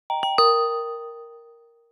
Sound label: Succes, notifications